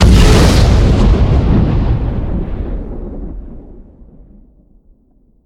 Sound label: firey explosion